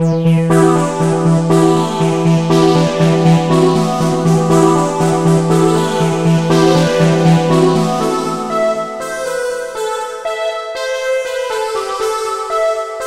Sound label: Synth Selection